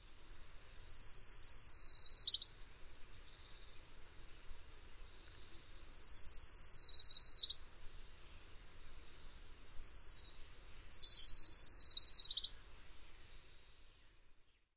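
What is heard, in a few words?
songs, orange-crowned warbler; CA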